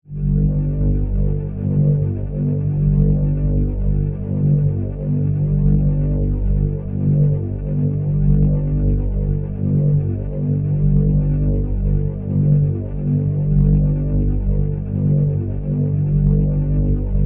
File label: Force Field Energy, Source; Science, Fiction; BlastWave